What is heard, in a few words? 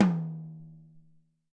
10 Tom, Alesis SR-18